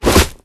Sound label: newspaper; scuttlebutt